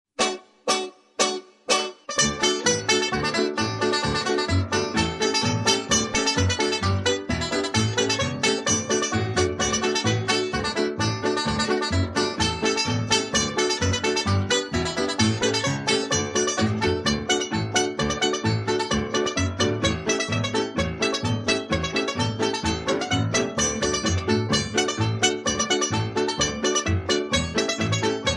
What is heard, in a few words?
banjo reveille extended, Instrumental; square dance music